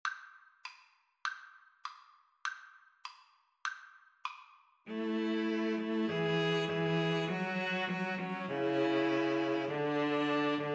old st nic, String trio

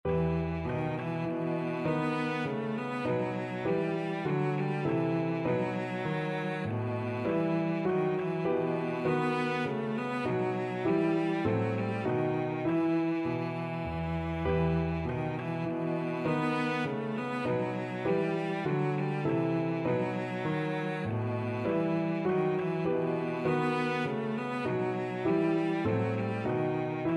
air, cello

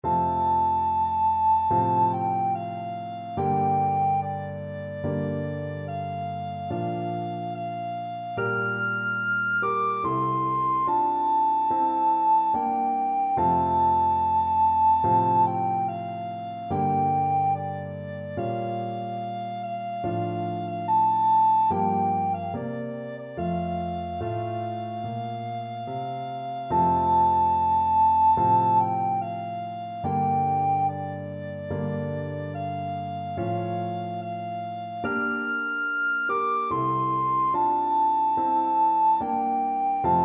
deep river, recorder

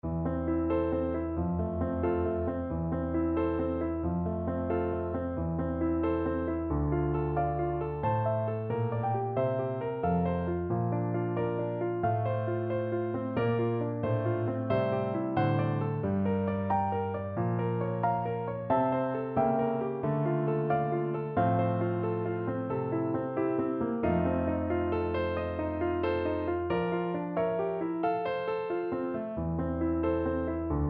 zueignung, Trumpet; strauss op10 1

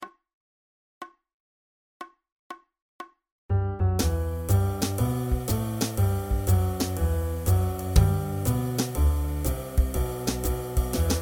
bach bouree flute

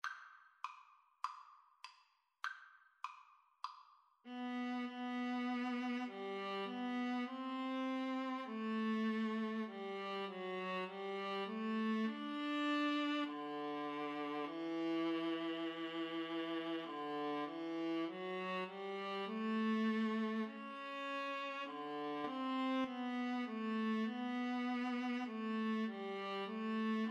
Violin Viola Duet

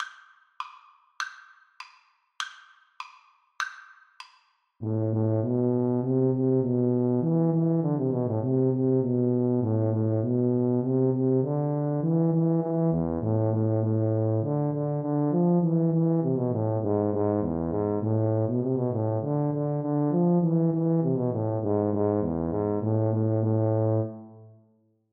Tuba duet

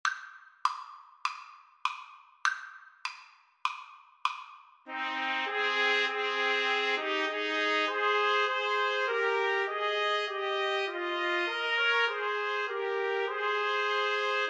Trumpet Trio

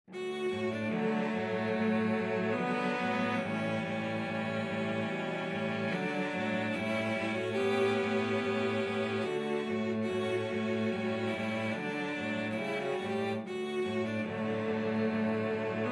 cello quartet

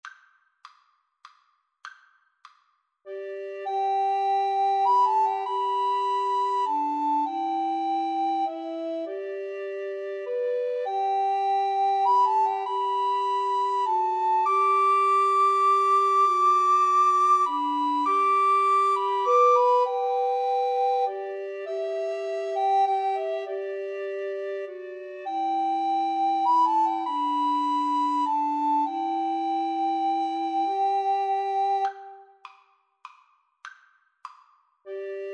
amazing grace, Recorder Trio

